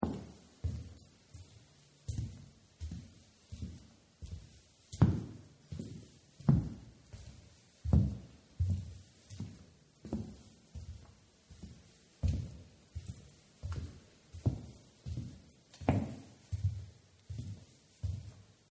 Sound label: Footsteps Wood Heavy Loop; Human